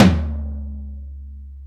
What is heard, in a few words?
tom, Roland; Club-50 - Foundations Roland; Ext.Snare 3